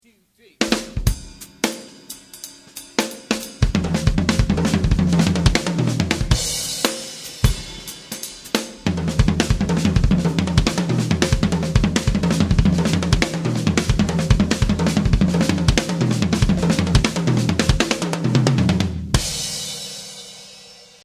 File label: Lick Example; Warner Bros Publications